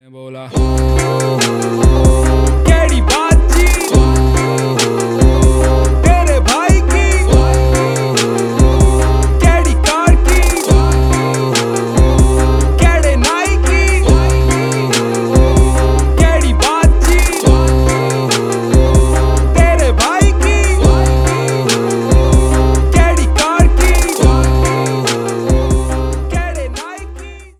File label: Song Instrumental